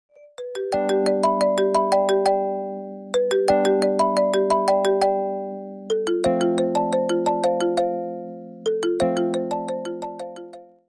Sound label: iphone marimba ringtone remix; iphone 16 pro max